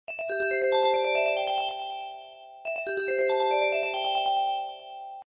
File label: sony ericsson chimes; ringtones